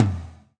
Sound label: GATE TOM, Drums Acoustic